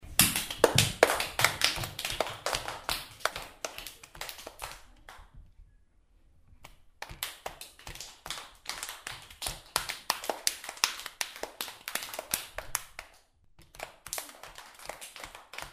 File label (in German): melodisches klatschen